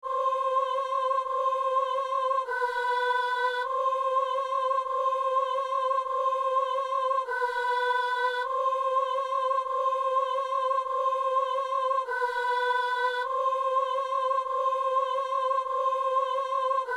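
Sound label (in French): ténor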